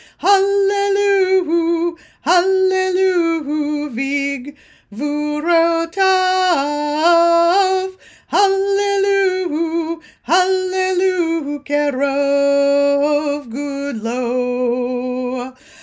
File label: Psalm 150 Verse 2; Kulanu Joint Saturday Morning Service; Shabbat